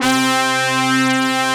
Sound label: brass C3; AKAI S-Series CD-ROM Sound Library VOL-2